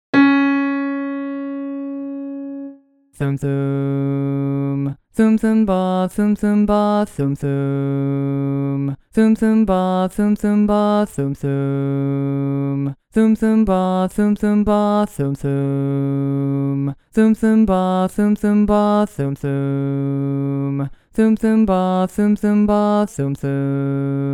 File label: bass alone